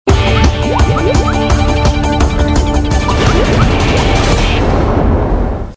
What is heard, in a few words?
Bumper; Double Dare 2000; Commercial bumper used for Double Dare 2000.